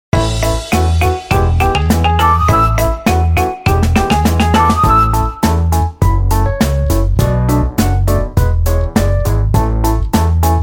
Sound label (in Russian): минусовку